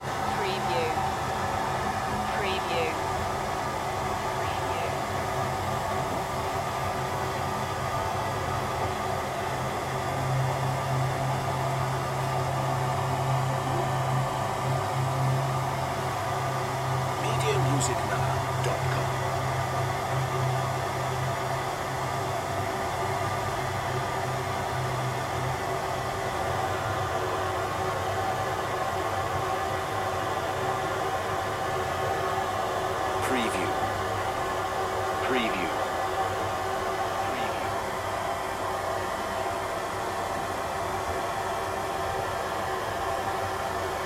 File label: train toilet ambience; Vehicle / Transport Sounds / Trains / Subway Sounds